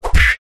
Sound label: cartoon punch